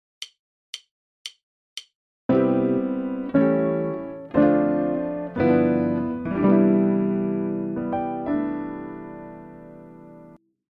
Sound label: GUIT